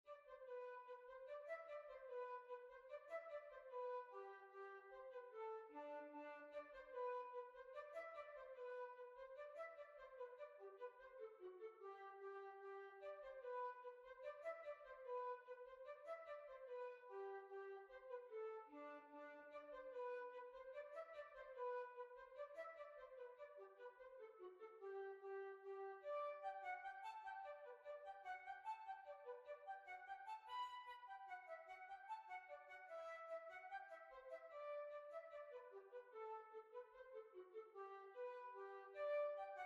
Grand Hornpipe

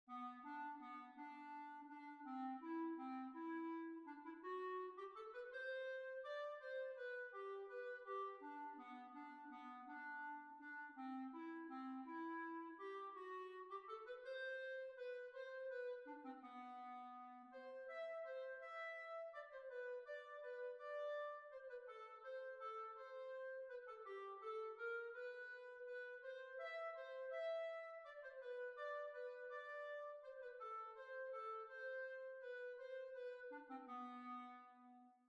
Waltz

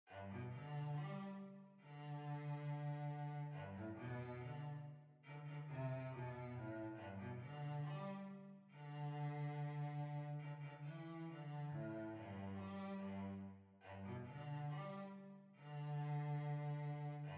Hornpipe, Violoncello

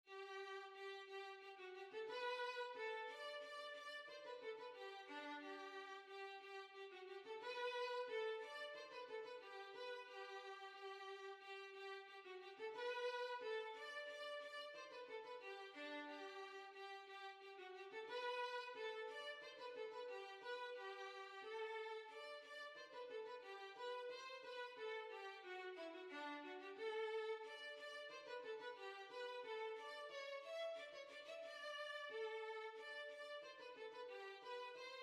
Violin

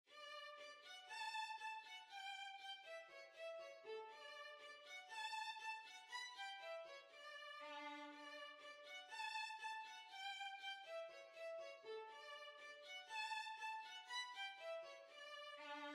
Violin

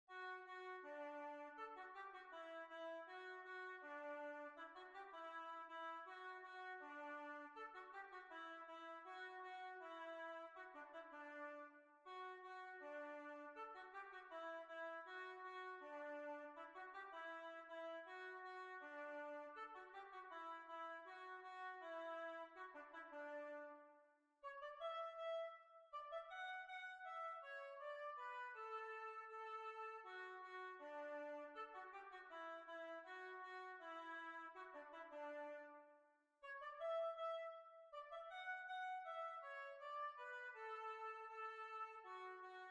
Jig

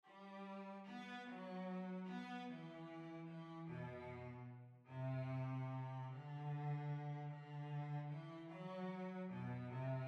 Waltz, Violoncello

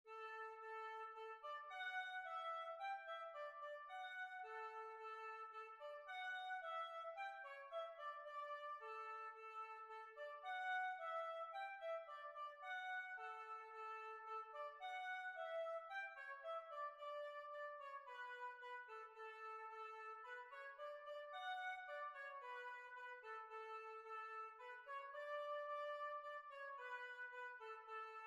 Heel and Toe Polka

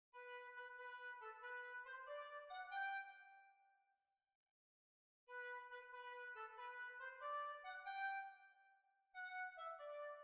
Dance Oboe

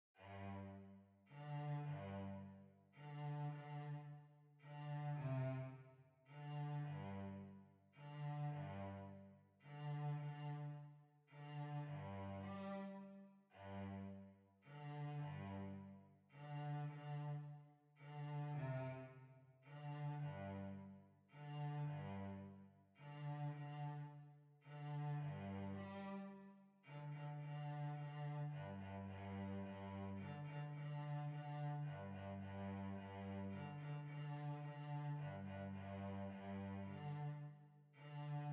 Waltz, Violoncello; Altenberg Waltz

